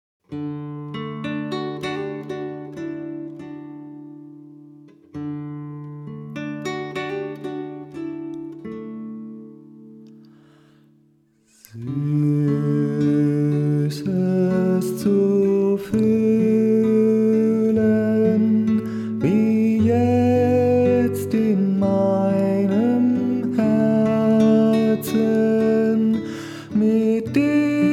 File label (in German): Sonnengesang